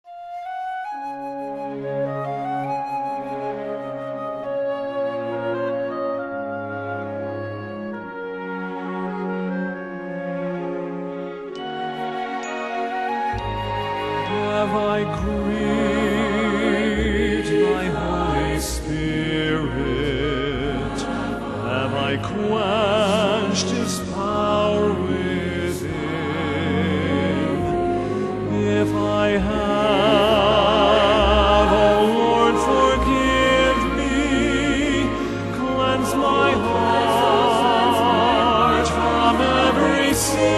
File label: Solo